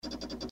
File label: fast 5 clicks